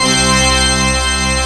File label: PIPE ORGAN C3; AKAI S-Series CD-ROM Sound Library VOL-8